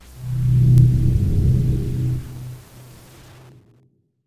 Fade In Static Burst; soundhacks

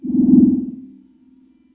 warp, power Up Down Sounds

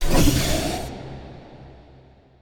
Power

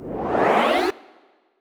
Power Rev, power Up Down Sounds

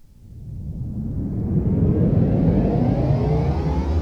Power Reverse

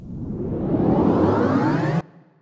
Power rev, power Up Down Sounds

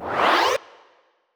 Power Rev, power Up Down Sounds

